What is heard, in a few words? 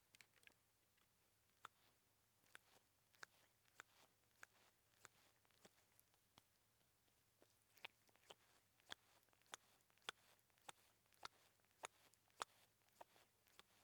cat; 猫の毛づくろい